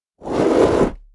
shadow attack; Paradise; shadowdemon

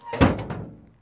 bin close

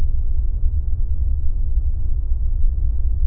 cave loop; Spookystation

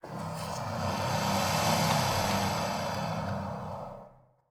low hiss, alien voice

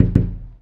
Glass knock

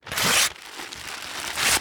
package unwrap; VOREStation

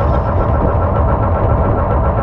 gateway active; clockcult

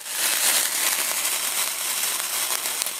fuse

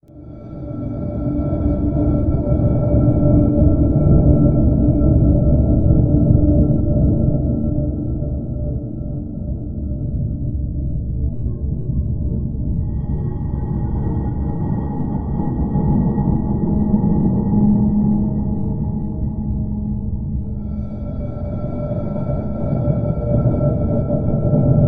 ambience

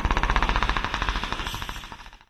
talk, alien voice

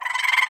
warble; VOREStation

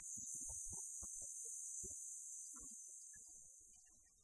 computer end; machines

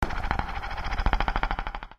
hiss

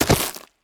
resin Hit, alien

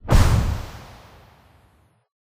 bamf; Polaris

